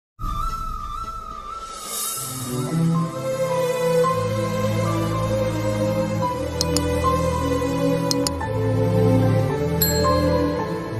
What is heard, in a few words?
love bgm ringtone; parugu movie